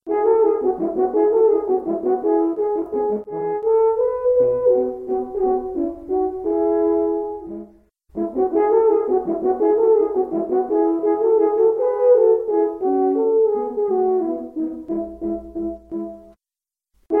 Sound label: allegretto, 2 horns; Stich J.V.: Duet Nr. 4Allegretto